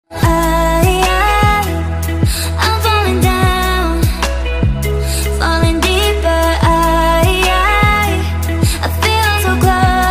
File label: Romantic English Song Ringtone